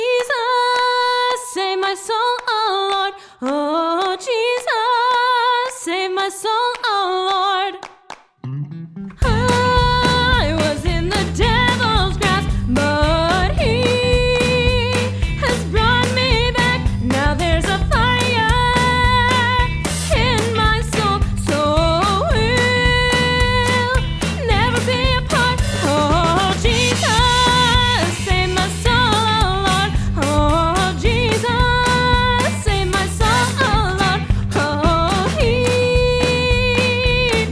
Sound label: Gospel; musdb18; Music Delta